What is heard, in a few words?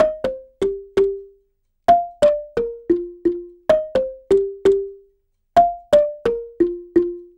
Marimba 130bpm C, African; Quazibeat; African Secret